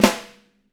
6X12 ALUMNUM, Living Drums; Sampleheads; Peter Erskine

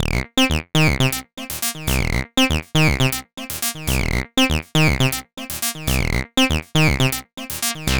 UR 303, 303 loops; Sonic Foundry (Sony Creative Software); Ugly Remnants VOL-2

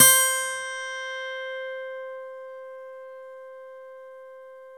SLIPPICK; MORPHEUS

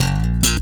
BASS SLAP; E-MU Formula 4000 Series Vol. 1; Hip Hop Nation

